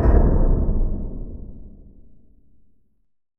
big clank

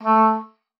A3, OB D.AMORE; Xsample VOL-7

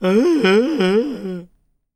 AUH, SHOUT; AKAI S-Series CD-ROM Sound Library VOL-6

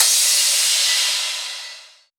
Crash, Cymbals

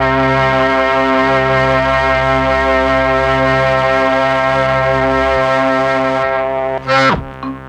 MEL STRING; ILIO; Propeller Island

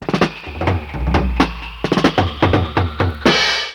FILL SD, Ragga & Dub Reggae; USB Soundscan vol.27; FILLACOUS

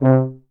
C3 TUBA

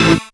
syn a, Dance Synths Stabs & Hooks; vol.37 - Dance Synths

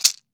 MARACAS; AKAI S-Series CD-ROM Sound Library VOL-5

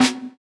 Snare, jungle breaks drum sounds

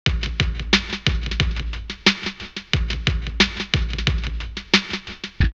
Island Loop 90, drumloops OldSchool; X-Static Goldmine

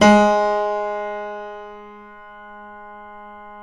PNO FF; Synclavier Sampler Library

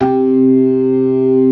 ORGAN, C3 PERC; Keith Emerson Signature Series

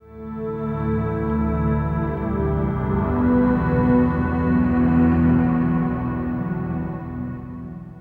Acoustic Drone, Long Loops

